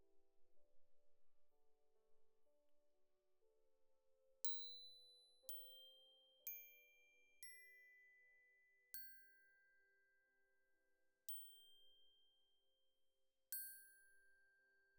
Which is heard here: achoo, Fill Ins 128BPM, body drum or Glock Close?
Glock Close